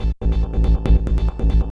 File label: Industrial 140